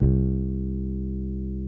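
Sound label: electric bass; ZERO-G; Datafile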